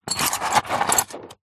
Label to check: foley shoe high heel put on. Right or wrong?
Right